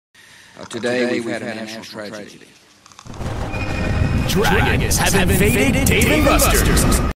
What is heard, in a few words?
dnb; cave